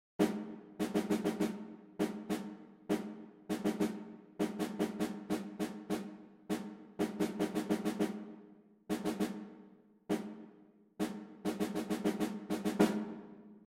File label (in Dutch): tentamen ritmisch, solfege